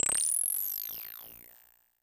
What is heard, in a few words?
percussion, Roland TD-20